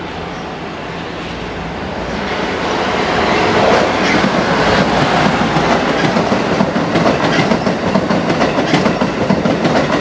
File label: train